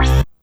Synth Hit C

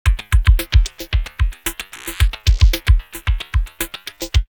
112, drumloops, DrumMachines; X-Static Goldmine

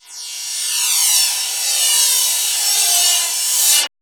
METAL SWIRLS; Spectrasonic Distorted Reality 1

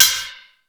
MONDO PERC, METALIC DRM

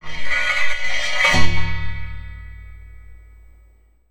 Raw HiHat, contact mic

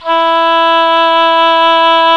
E4 OBOE; ORCH.COMP

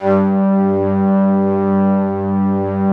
ST ORG, STRINGORGAN, Yamaha VP-1

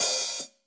HH, Drums; Bob Clearmountain Drums I

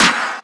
Heavy Snare; Zero-G Sound Sense Grime Scene; GS Kits

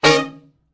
Killer Horns, SECTION 7TH; Best Service

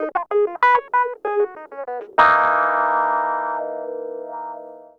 Clavinet, 70s Analog Funk; Sonic Foundry (Sony Creative Software); What it Is!

